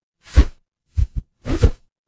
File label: transition swoosh